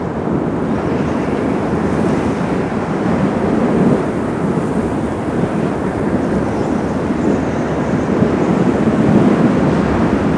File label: wind loop; frozen